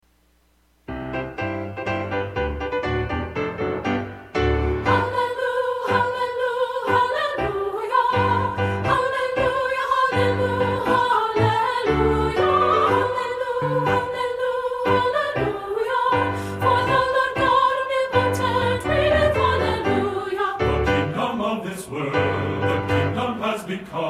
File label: jingle bells hallelujiah